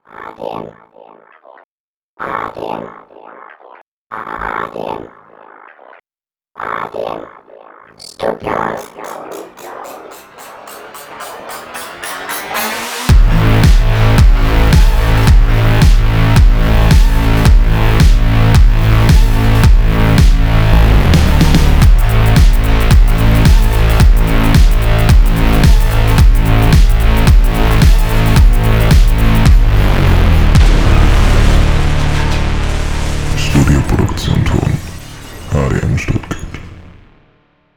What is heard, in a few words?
Ton EDM